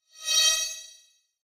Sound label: Pop Up HF Elect Pulse W, pop up element